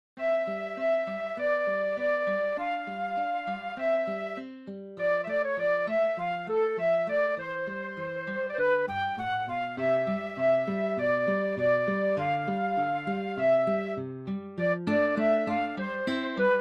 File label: andantino; Call, Leonhard von